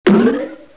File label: blip